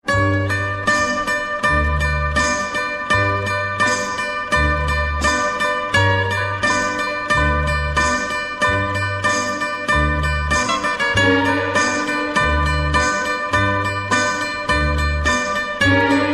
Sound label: greek ringtone; Grecesti; ton de apel